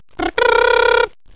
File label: BAH BAAAH